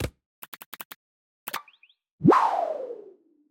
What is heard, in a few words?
Land Move; Itomaru Land Move001